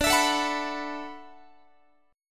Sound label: D 6th Add 9th D, Guitar; D6add9 strummed; JGuitar